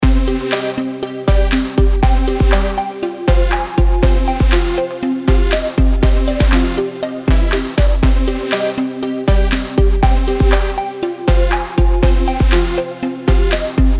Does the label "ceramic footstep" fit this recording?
No